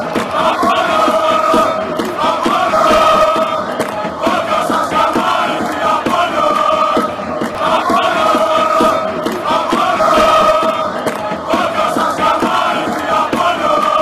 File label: chant, Greece; apollon